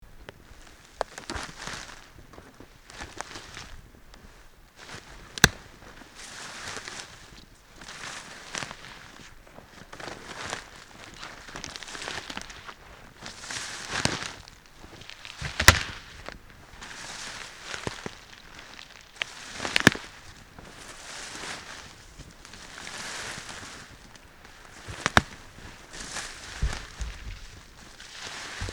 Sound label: walking through dense forest